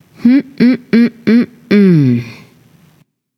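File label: thinking, female; smite; voicepack; hera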